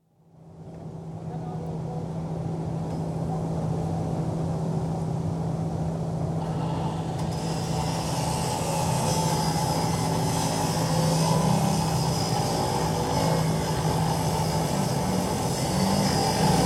General atmosphere on building site, closer perspective, steel girders cut and general site activity; Construction Sound Effects; BBC